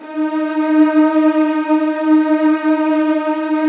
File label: D#1, Virtuoso Strings